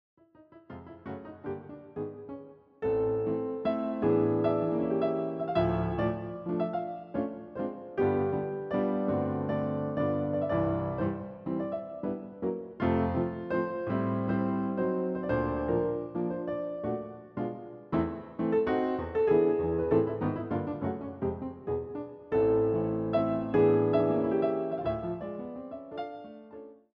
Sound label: Liebesleid in A minor; Kreisler; played by Rachmaninoff